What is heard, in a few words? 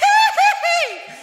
hee hee hee, laugh; ooh ahh